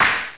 pan

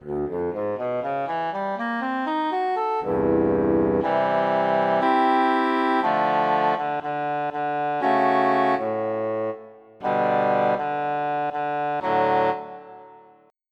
English Horn; FF7

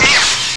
Schzapp!; Chiral 1.0.1

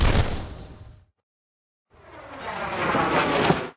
Cannon Enemy Fire; Pirates Of Treasure Island

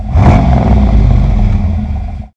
Corvette; Vehicles; Question

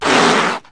CAT HISS, ANIMALS, CATS; KABOOM! - Wild and Wacky Sound Software

